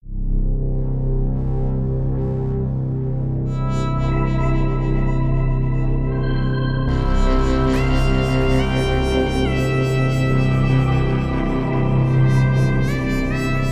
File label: cosmic exploration